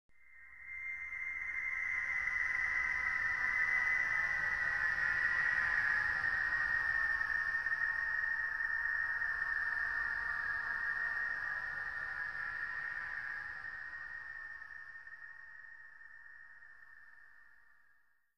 whine; Myst III Exile

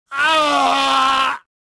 death; jedi

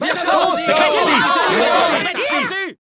burst, Crowd German

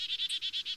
Birdsong Oneshot; Totaled; XBOX

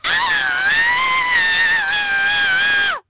F shake; People

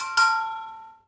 Ding; Cars; XBOX; Ambient

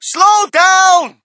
crowd, shout; Mike Tyson Heavyweight Boxing

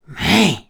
sigh; Cars

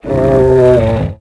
die; tusken King; Star Wars Obi Wan; XBOX

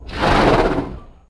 snort, Dino

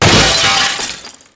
glass break

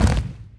boulder clack; impacts explos

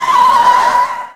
burnout, Cars; XBOX

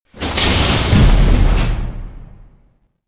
door fast open; movers

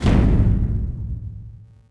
metal hit hollow; objects; Star Wars Obi-Wan; XBOX

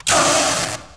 lightsaber hit

